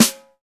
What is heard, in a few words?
snare paper; Roland L-CD701; Snares 3; Sn Modules 3